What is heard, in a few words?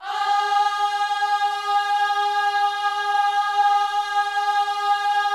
OHS G#4, Gospel; Spectrasonics; Vocal Planet Vol.1 Gospel; akai